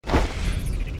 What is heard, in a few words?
double jump